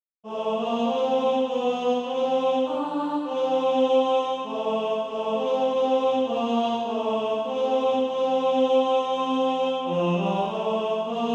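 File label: tenor, Practise Music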